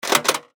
Steampunk Button, Scifi, Steampunk, Button, Switch; Science, Fiction; BlastWave